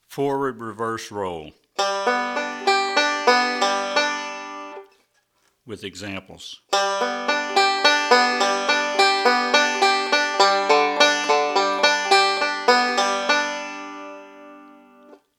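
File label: Forward Backward Roll, banjo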